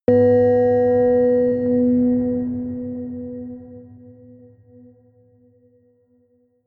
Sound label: melodic odd harmonic bell high; toene